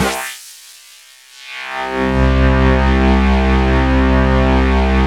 DANCE SYNT, techno trance; E-MU Formula 4000 Series Vol. 2

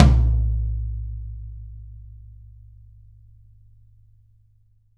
YAM18 ROOM, Drums; Big Fish Audio; Ross Garfield Drums VOL-2

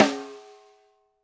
Snare, Roland TD-20

